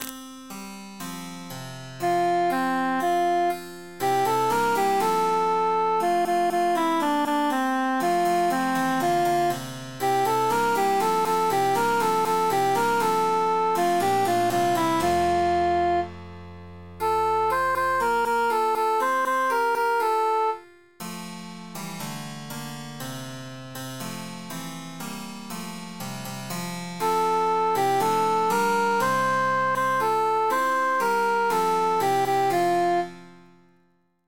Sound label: cantus emphasized; psallite unigenito